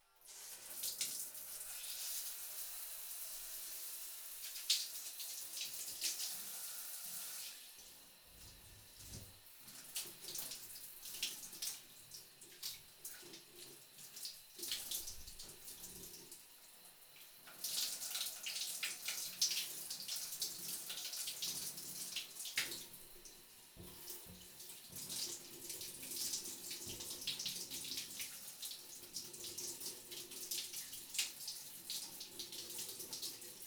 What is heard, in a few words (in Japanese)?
シャワーで髪を流す; 3DIO; お風呂に関する音